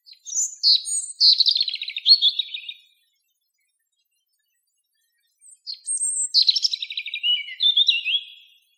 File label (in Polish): rudzik śpiewający samiec